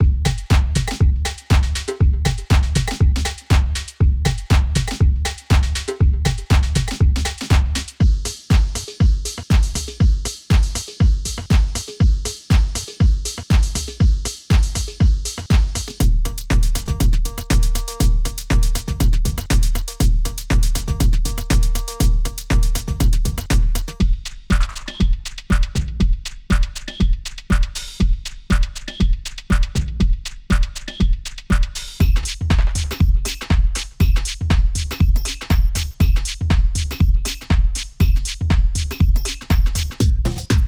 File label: deep house beat loops